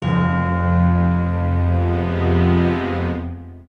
Death Defeat; ratatouille 2007 psp

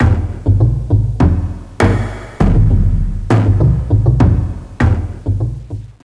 Friendly Village; sid meier s civilization revolution 2008 nds

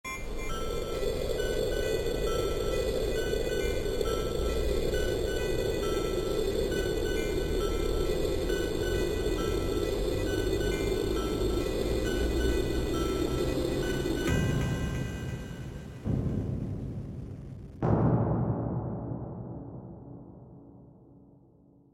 Ominous Beginning; mary s arcade

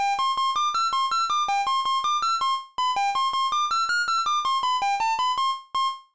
Jingle, CLASSIC FROG DOCK; Frogger Helmet Chaos; nintendo ds

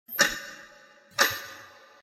Clock Sound Short

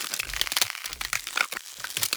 Freeze Loop; Goddess of Trampling

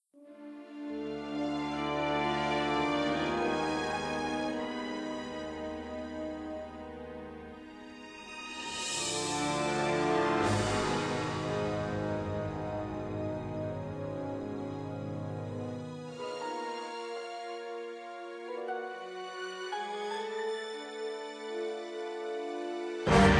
Music Idle; Zak Storm Super Pirate; mobile

